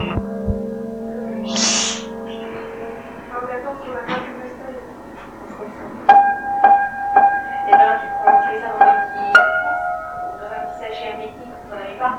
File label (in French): Avec un piano